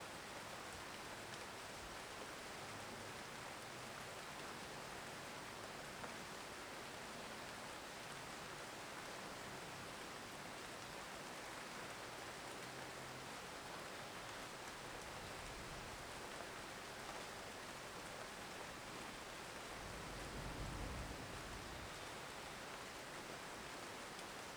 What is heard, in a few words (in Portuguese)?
Ambiente interna de casa com chuva fraca; ambiente interno